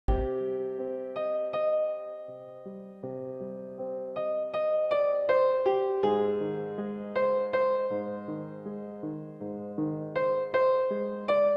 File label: background music; kasam